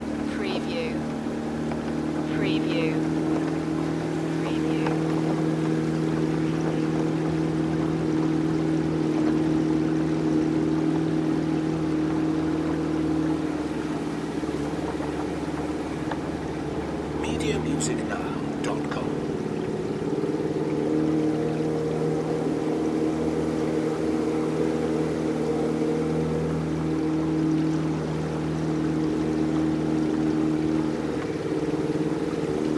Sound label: small motor boat; Boats